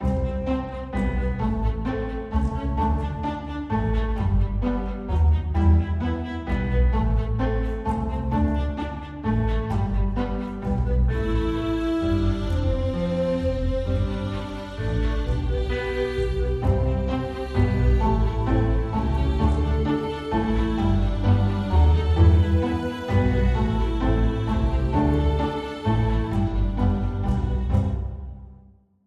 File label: Underscore